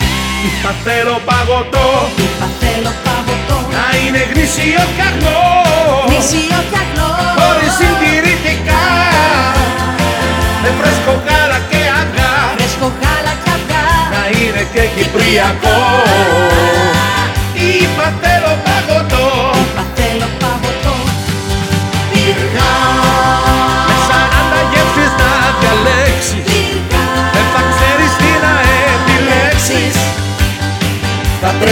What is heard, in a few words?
jingle; pyrga